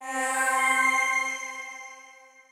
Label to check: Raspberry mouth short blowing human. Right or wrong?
Wrong